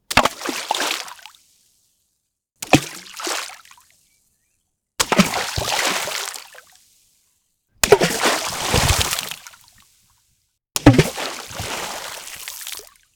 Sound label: Rocks Thrown In Water